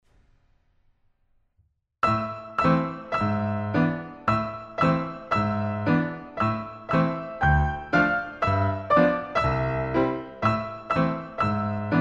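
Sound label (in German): Klavier 4 Haendig Slawischer Tanz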